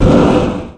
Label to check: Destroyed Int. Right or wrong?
Right